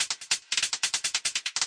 140 verby click roller hats, drum loops and breaks